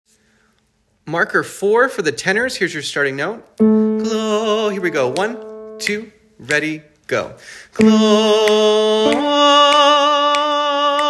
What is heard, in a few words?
Tenor, choir